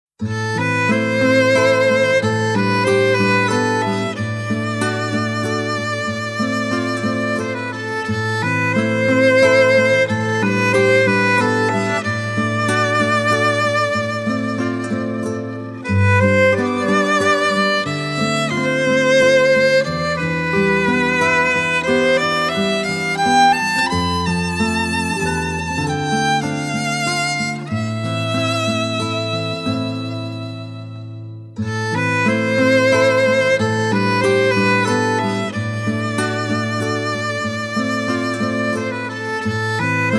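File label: instrumental music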